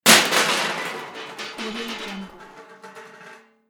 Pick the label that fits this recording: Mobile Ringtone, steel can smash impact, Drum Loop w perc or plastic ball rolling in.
steel can smash impact